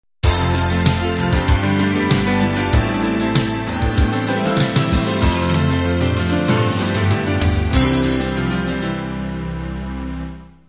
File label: folk ballad end